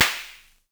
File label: Snare, Univox SR-95